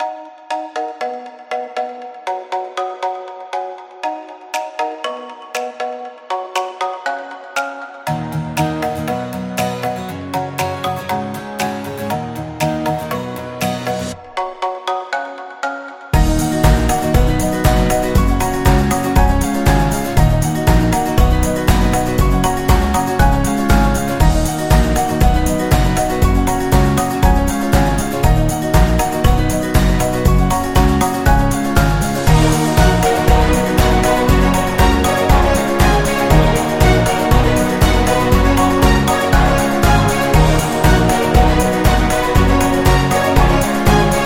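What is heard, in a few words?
Food Upbeat, Kids background music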